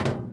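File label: trapdoor run, footsteps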